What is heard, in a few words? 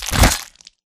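Stalker Movement Synced Melee Chest punch Extract; Titanfall 2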